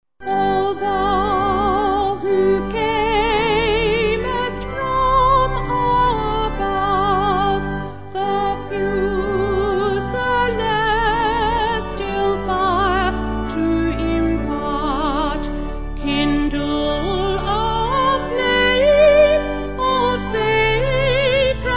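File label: Vocal Organ, church music backing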